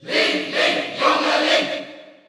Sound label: Cheer Dutch; Young Link; SSBU